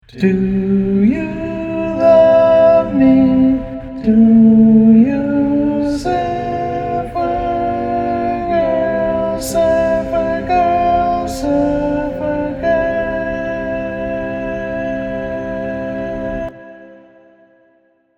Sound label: Tenor, Barbershop Tags; Each recording below is part predominant - one part louder, other parts quieter.